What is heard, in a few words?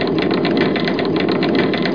cat drop; h2siege